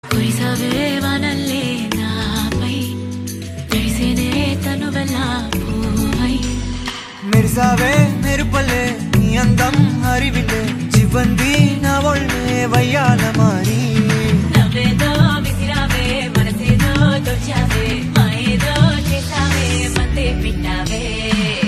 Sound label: Ringtone, Telugu; Varun Doctor